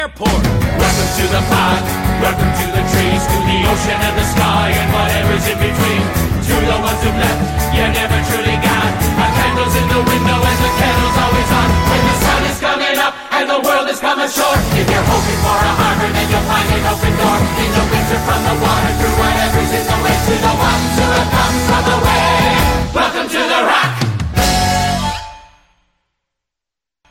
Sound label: men vocals